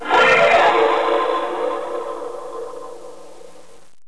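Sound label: unknown creature; halloween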